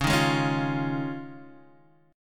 D sharp 7th Suspended 2nd Suspended 4th C sharp, Guitar